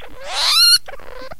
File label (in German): streit, octodons